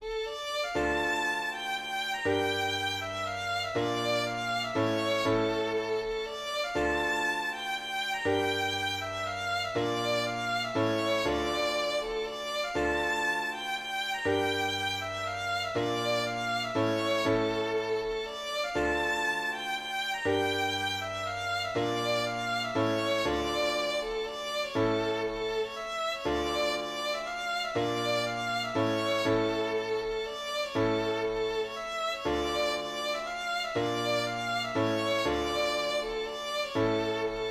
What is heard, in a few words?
mazurka